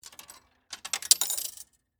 Pay Phone Insert, Technology, Telephone, Coin, Insert, Into, Pay, Phone, Rejected, Dime; BlastWave